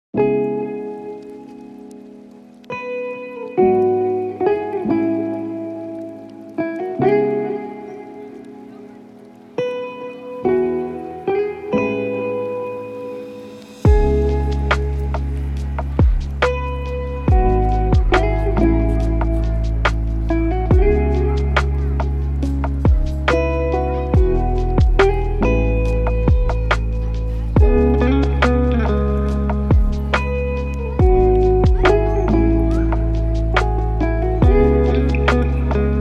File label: lofi hip hop